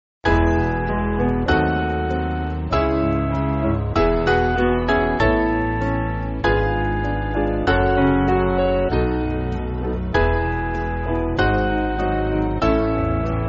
Piano

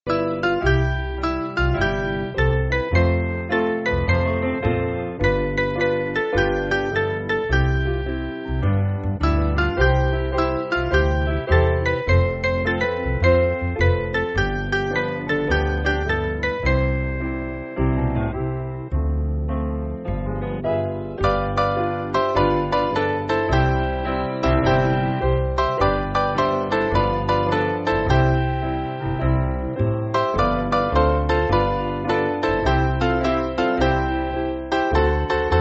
Piano

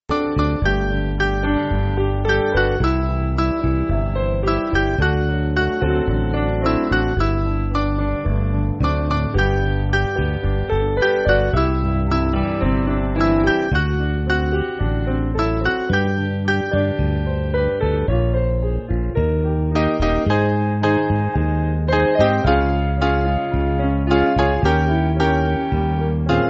Piano

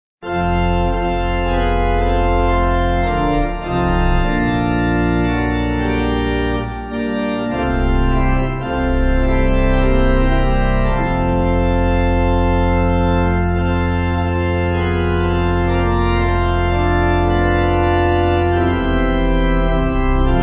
Pipe

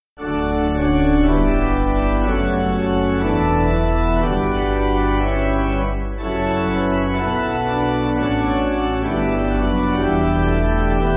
Pipe

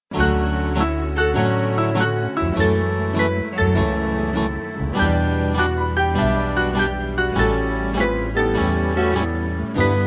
Band

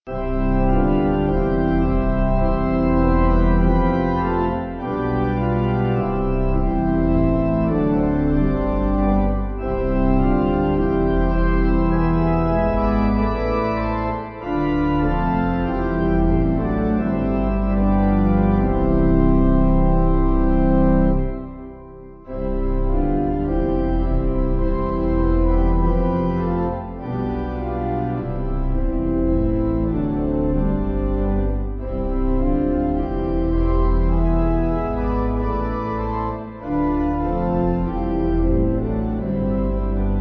Pipe

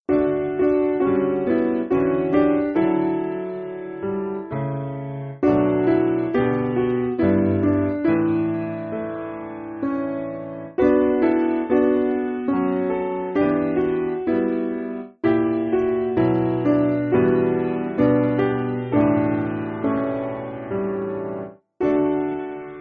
Piano

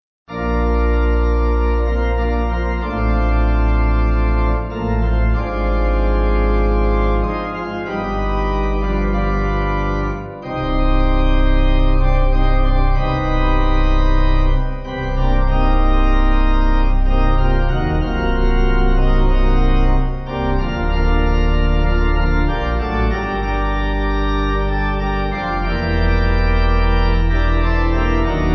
Pipe

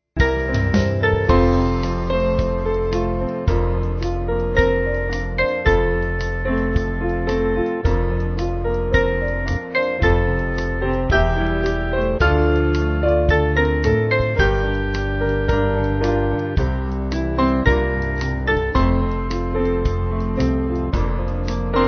Band

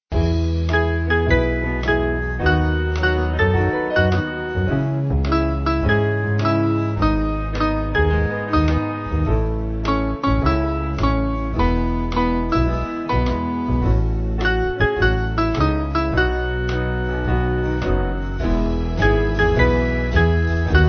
Band

